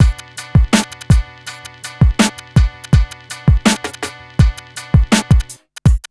BEAT, Burning Grunge Hip Hop

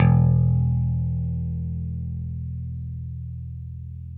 6STR BASS, E; Sampleheads; Whole Lotta Country 1 & 2

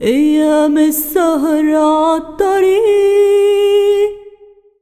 SINGER, ArabianTraditions

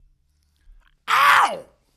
Vocal, 70s Analog Funk

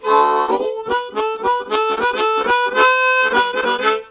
Octave Boogie, Harmonica; Zero G

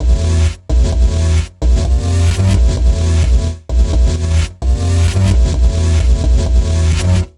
Bas Fx Loop 130; Equipped Music; Loungin House

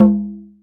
Tubano, drum machines

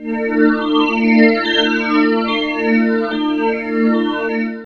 Last Night D, Pads; Zero-G; Total Drum Bass

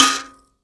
MBIRA C#4; BS MBIRA1; Heart of Africa

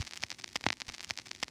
TurnTable Loop, Yamaha DJX 2B

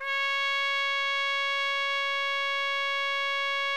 TRUMPET; Best Service; Killer Horns

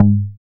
BAS MKS-50, Roland

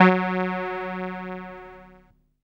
SIEL, String Machines

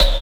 808 Snare, Kit; Sonic Foundry (Sony Creative Software); Esoterik Beatz

